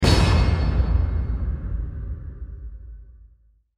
score tick; odin

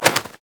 ravens animated win; LoL; emitters map8; odin

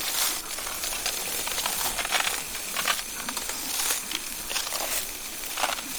pain loop enemy; LoL; trundle

